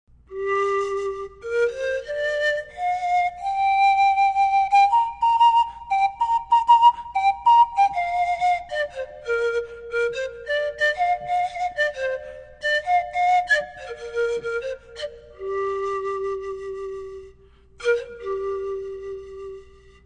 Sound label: Medium Andean, Pan Pipes